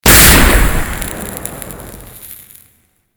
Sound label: Explosive Arrow, Explosions; Warframe